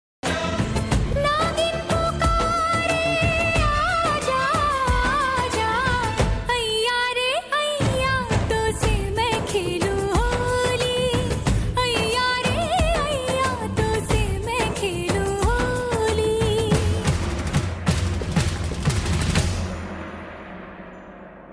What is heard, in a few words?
Naagin Hiss